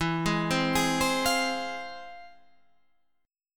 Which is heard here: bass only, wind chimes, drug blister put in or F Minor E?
F Minor E